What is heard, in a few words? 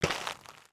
Foot Dirt Sneaker Walk, footsteps